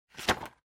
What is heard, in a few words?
Calendar Flip